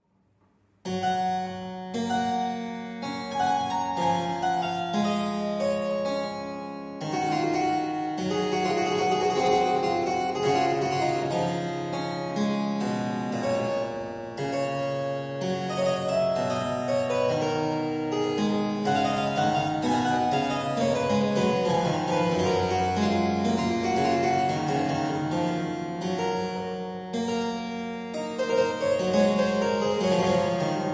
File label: aria, harpsichord; Johann Sebastian Bach; Goldberg Variations BWV 988